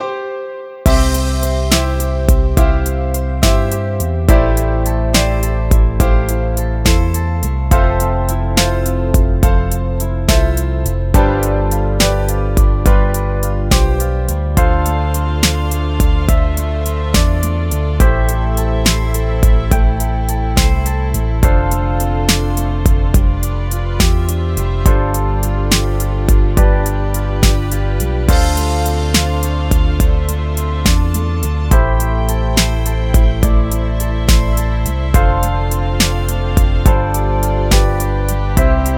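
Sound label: love no brass; By Burak Sound Bank; mixed vol 2 evrensel karisik temalar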